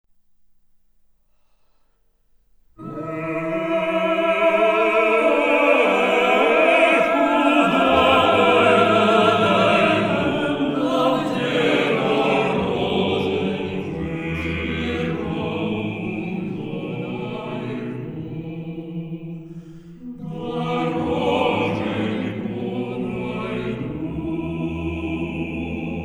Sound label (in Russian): Хор